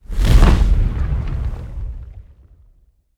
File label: skill snake screen attack fire